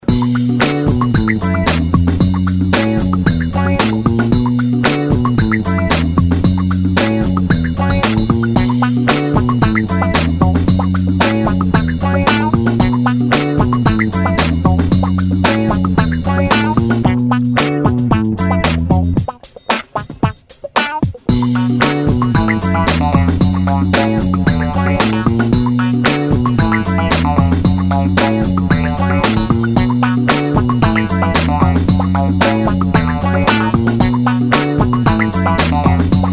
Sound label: Melody, RingTune